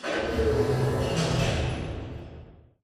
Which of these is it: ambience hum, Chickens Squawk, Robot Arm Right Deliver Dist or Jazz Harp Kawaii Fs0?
Robot Arm Right Deliver Dist